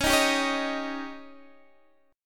C sharp Suspended 2nd Flat 5th C sharp Guitar Open D, chord